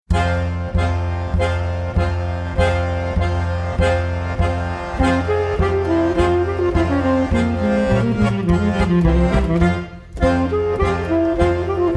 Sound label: bassline teaser